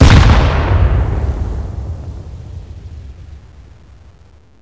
he grenade detonate; csrevo; nades

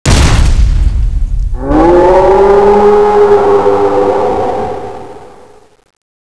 scene appear; fallen titan

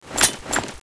gunkata skill last

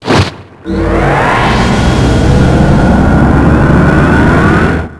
powerup; shmod; vegetto

